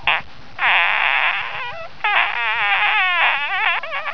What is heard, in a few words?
high pitch